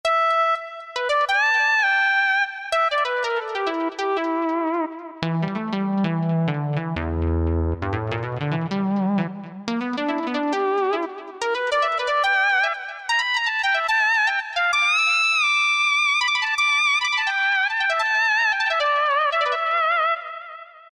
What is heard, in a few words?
Mini Pulse; Generalmusic Equinox